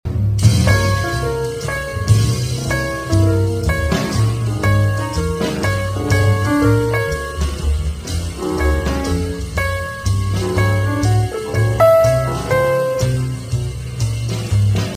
phrase half speed

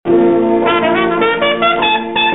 blues Bb maj phrase

